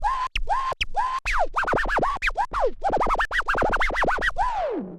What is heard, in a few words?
OLDSCHOOL SCRATCH 96